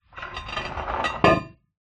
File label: industrial manhole cover metal close